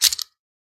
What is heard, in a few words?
old camera sounds; soundscrate